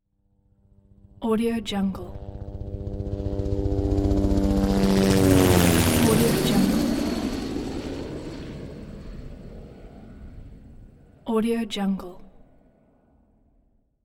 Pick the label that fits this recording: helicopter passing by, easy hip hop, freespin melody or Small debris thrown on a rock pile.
helicopter passing by